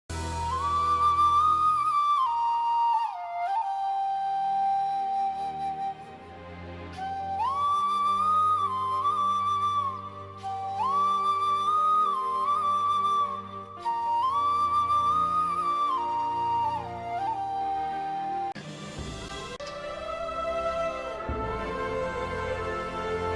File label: background; zindagi ki mehek